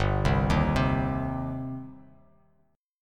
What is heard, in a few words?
G Suspended 4th Sharp 5th, Bass Standard, Gsus4#5 chord; arpeggiated; JGuitar